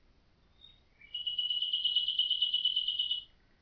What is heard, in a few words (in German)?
Nachtigall Ausschnitt